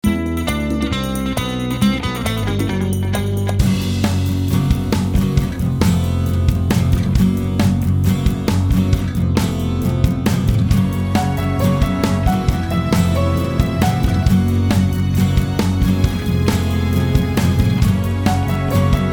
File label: Acoustic Rock; Cues for Film and TV